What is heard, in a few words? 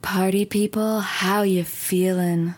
Party People